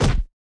fire impact; hades; smite

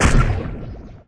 death bodyfall; smite; poseidon

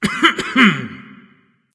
clearthroat; cuchulainn

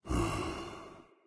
idle breath out; smite; odin

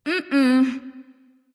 nv disagree; smite; awilix

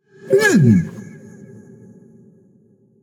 nv tongue; smite; voicepack; hachiman

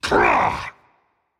grunt attack rage; chaac

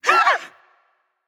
nv breath; smite; awilix

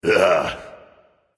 "ugh"; smite; voicepack; ullr

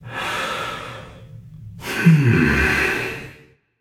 breath, voicepack; smite; jorm

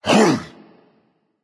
grunt attack berserker; cuchulainn

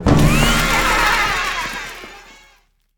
Death Pop; smite; kumbhakarna

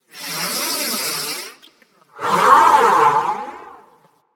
nv breath; smite; xbalanque

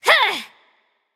nv battlecry; charybdis

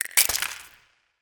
egg crack; smite; chaac

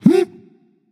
confused; smite; agni

